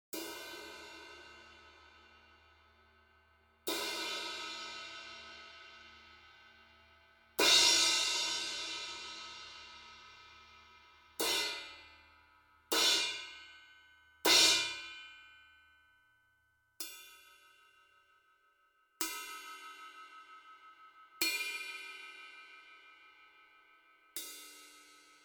Crash Cymbal 17 - Plastic Rutes

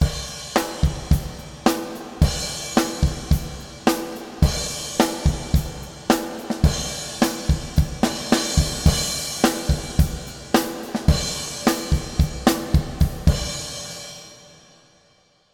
Reverb - Drums - Painted Gym; CRTIV Reverb

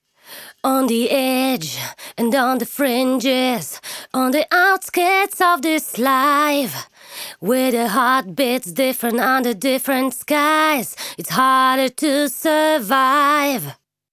vocals Softer Than Silk

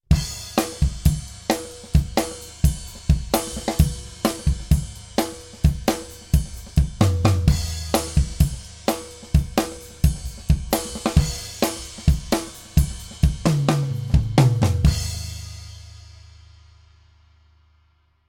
Ride Rhythm

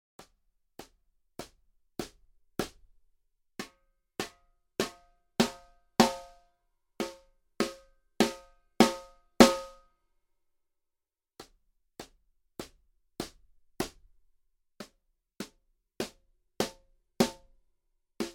Snare Drum 1455 - Plastic Rutes

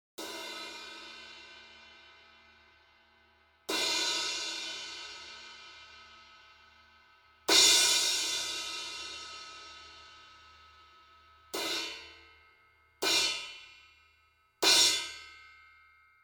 Crash Cymbal 17 - Hickory Sticks

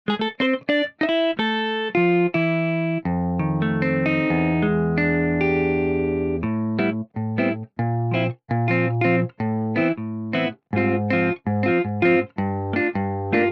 Jazz Guitar

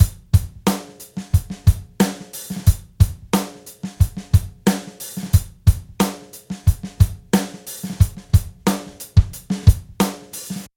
Acoustic Drums - Defined Kicks

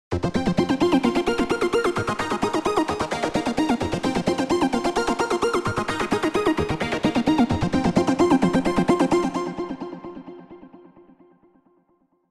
Arp Synth - Nice Hall; OldSkoolVerb

